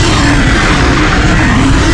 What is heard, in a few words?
Missile Travel